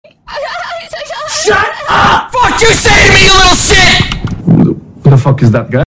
rage extra bass boosted; ninja

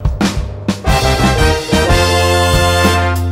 jingle; meet the sniper

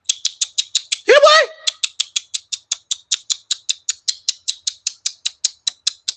roach call

